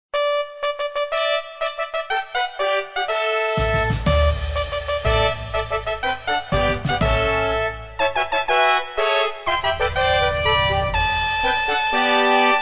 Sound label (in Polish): Fanfary